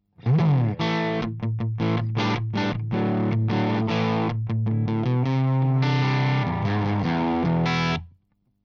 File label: crunch Position 3 KSM313 front